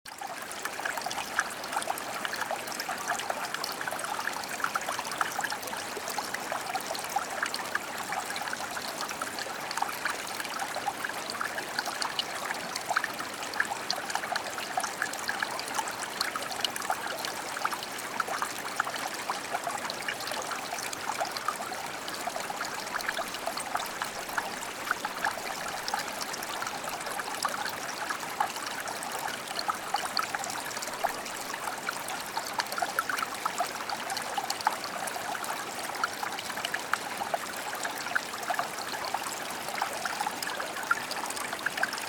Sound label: the sound of a flowing stream; River & Waterfall